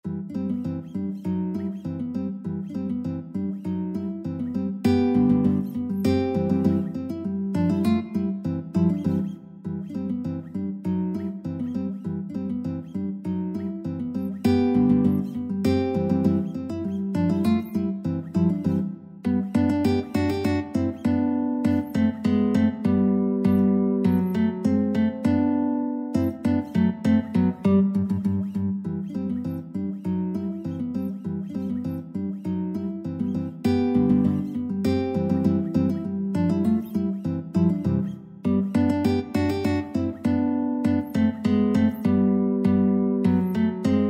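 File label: 2 guitars, guitar duet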